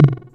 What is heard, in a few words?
Tom Delay, TR-606 Bent Samples; Sonic Foundry (Sony Creative Software); The Electronic Music Manuscript Collection; Battery 3 Sample Kits